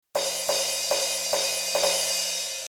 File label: interpretive drums